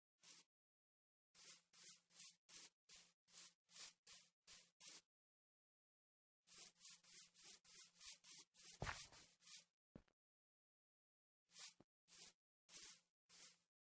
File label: grass